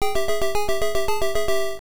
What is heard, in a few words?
Time Out Warning; Dig Dug; NES